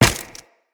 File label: Run Ice, Footsteps; Tenno